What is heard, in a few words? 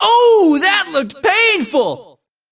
painful; p4u